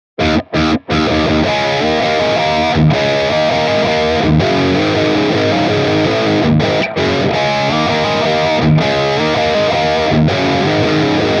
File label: Dirty Mesa, guitar